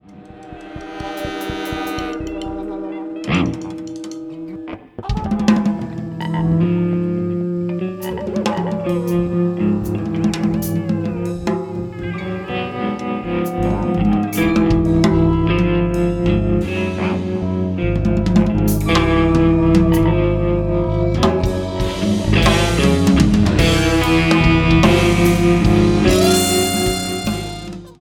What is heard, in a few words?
Piano Prelude